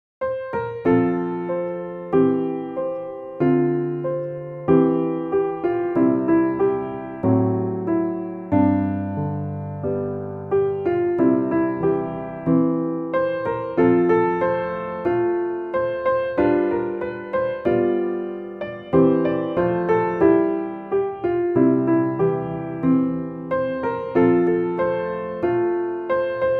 piano; The Spirit Fills My Heart

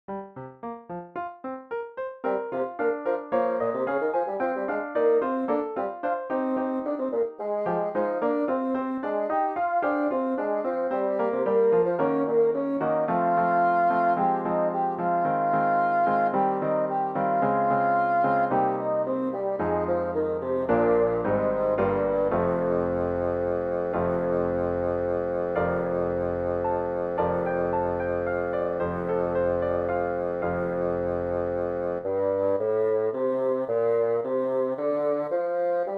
Bassoon

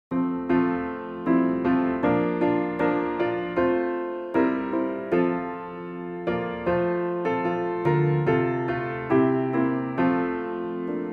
piano; The First Day

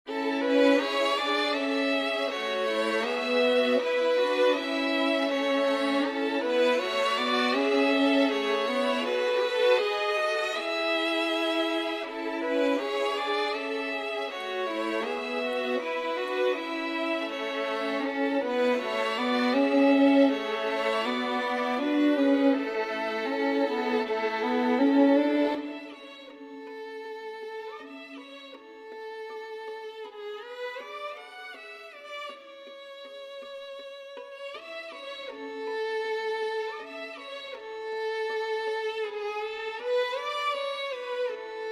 Winter Medley Violin A Major

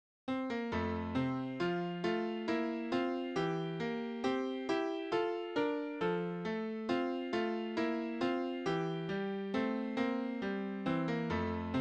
A Poor Wayfaring Man of Grief, piano

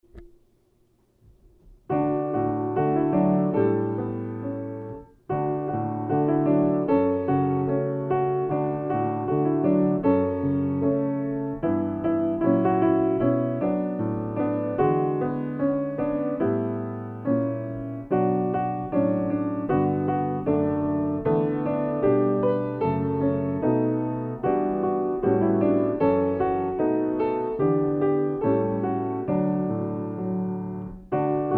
Piano Accompaniment; Thanks to Heavenly Father

